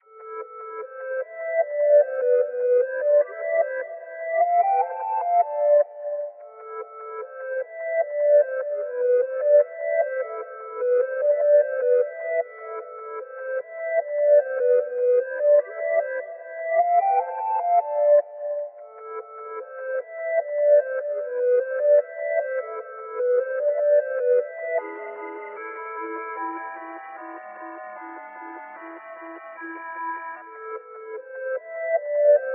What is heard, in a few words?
Win; ARSAS soundpack